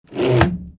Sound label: door sliding close